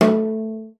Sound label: CELLO FINGER A3; Xsample VOL-5